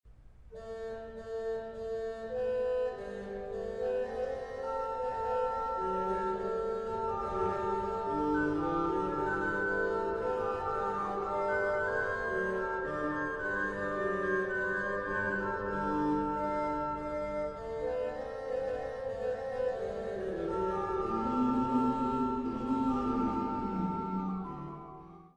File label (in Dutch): Orgelpartita; Psalm 46; Variatie 2